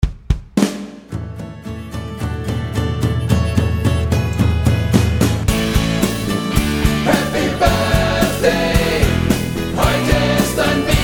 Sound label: Happy Birthday Playback